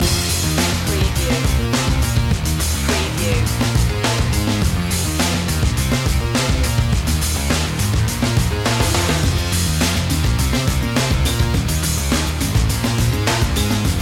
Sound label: rock slam loop